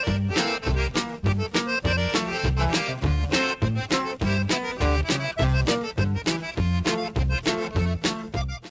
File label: accordion